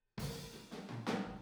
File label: Boogaloo - Room ST, 82 BPM; Drums; Intro; Sonic Foundry; Drums from the Big Room The Mixes